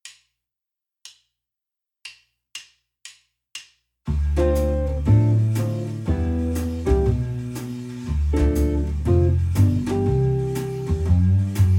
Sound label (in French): guitares 1 et 2